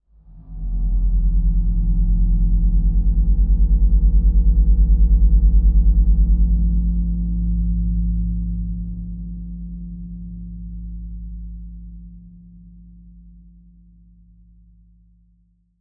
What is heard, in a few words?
Title Transition Stab Dark Long; Production Element; AdobeCC